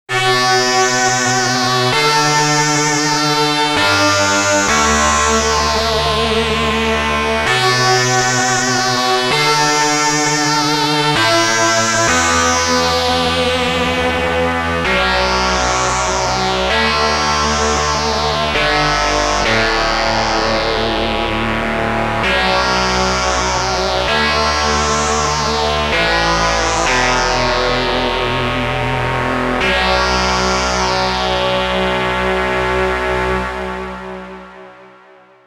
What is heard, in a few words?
Sequential Pro 2 FM Lead